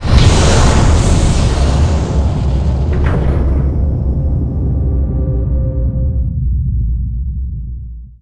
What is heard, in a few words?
Explosions